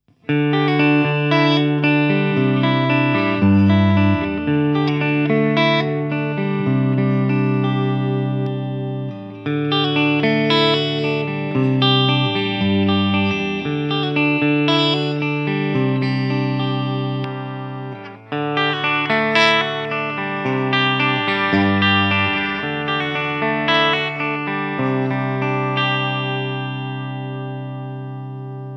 ESP Eclipse QM TEASB Duncan Humbucker Clean

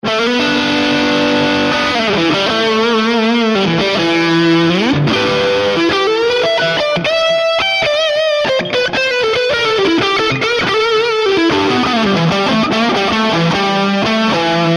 Jukebox Chorus Delay Reverb; Audified ampLion 2

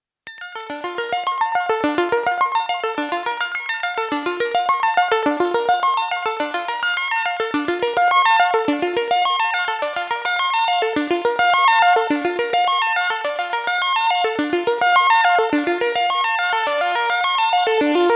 ARP 1601 PWM

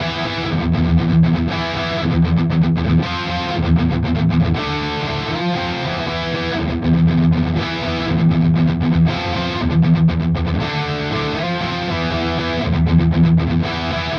Epiphone Prophecy Les Paul Zerre Bridge Vintage

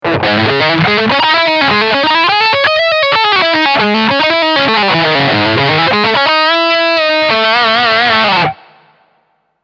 ibanez js140 wh

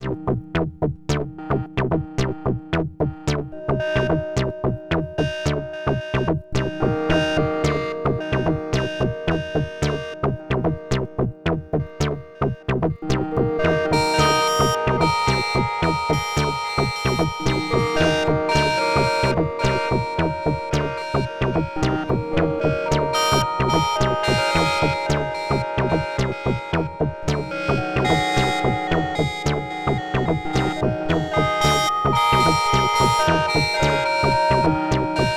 Töörö Bass FM Pad w SH Filter; Freds Lab

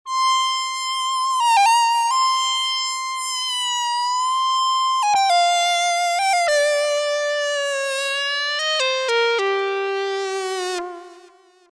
fusion hell lead

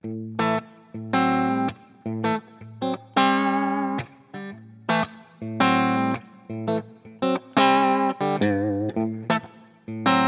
Clean 4x12 Vintage Basketweave; Scuffham Amps S-Gear; The Jakal

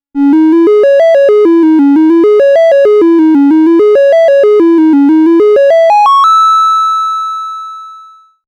Triangle Lead

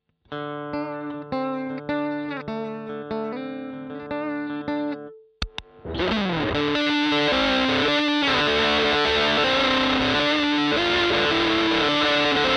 BM20 ULTRA Gain max Some Riffs

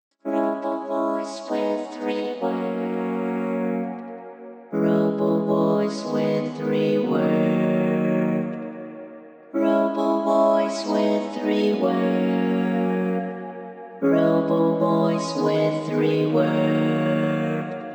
EH V256 Robo Reverb Bandsweep

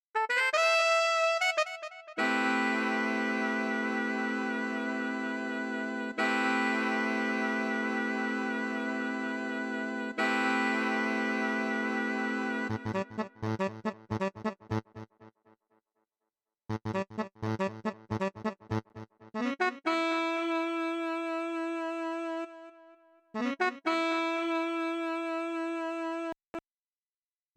Wind; Sampletank

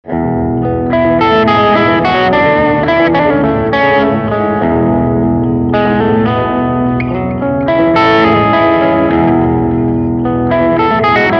Cello; PRESET Hello

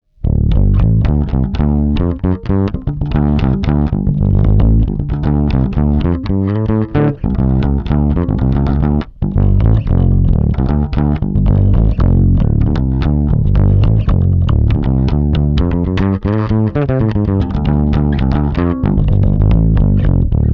Sansamp neutral Char mid

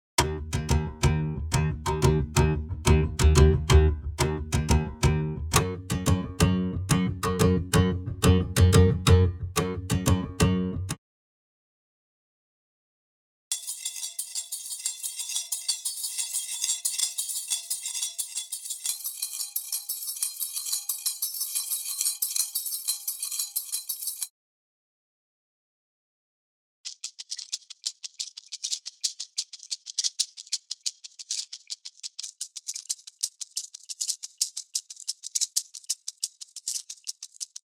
Albion One Loops; Brunel

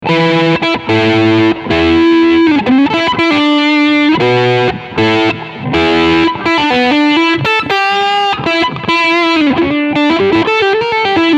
Crunch Steg SC

